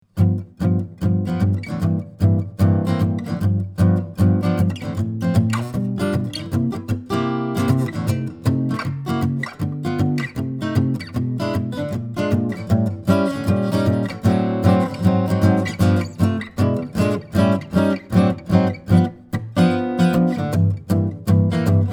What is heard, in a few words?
Jazz; AMI F44